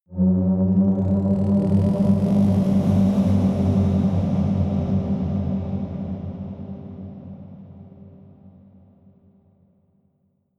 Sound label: whoosh tonal rumble rise